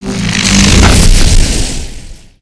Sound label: Javelin Charge